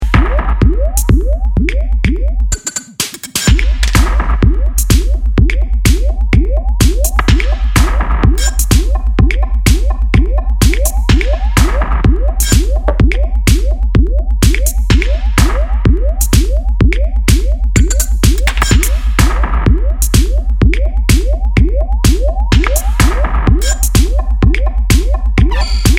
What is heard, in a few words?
octave fake